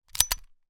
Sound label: gun 1911; weapon; still north media